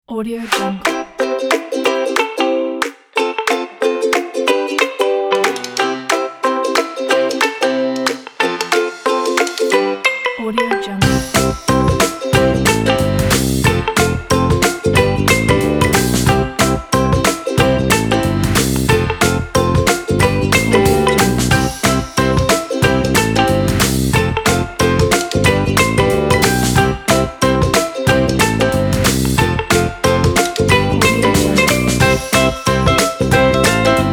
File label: for happiness